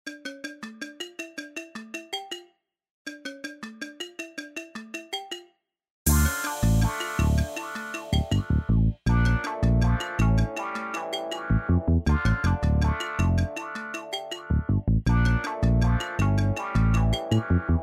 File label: Fugue